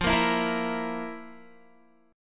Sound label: F Major 7th Suspended 4th F, Guitar; strummed